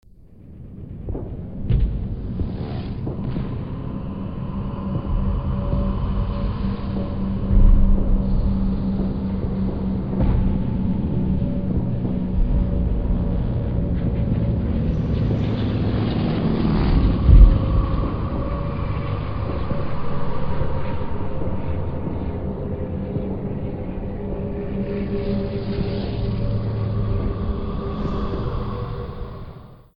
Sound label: Trench Ambience